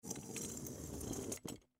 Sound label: Fire Extinguisher Drag Cement; Emergency; Fire Extinguisher Drag Light Cement; Emergency Vehicle Sound Effects